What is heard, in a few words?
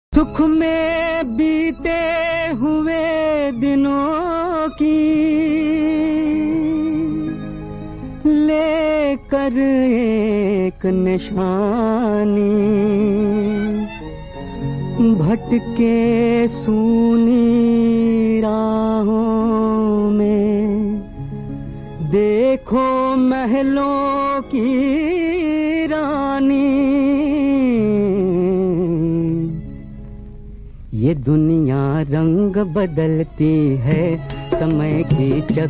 male singer; 1959 Satta Bazar